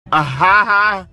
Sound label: uh ha ha